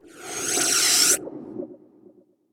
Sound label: Air Swoosh